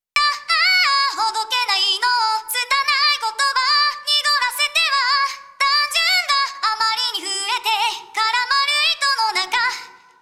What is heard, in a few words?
Vocal Plate Chorus After; RMX16; neve